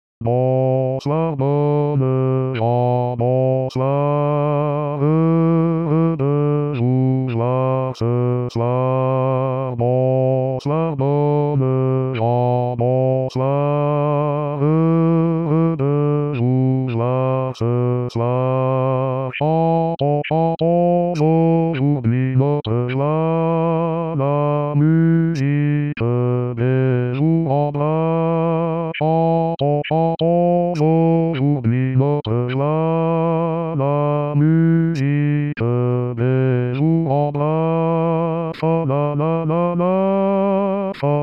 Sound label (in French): Basse